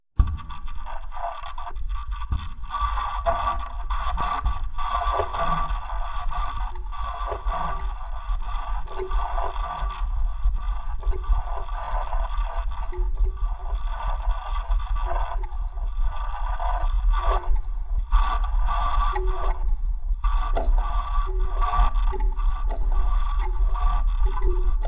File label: hdd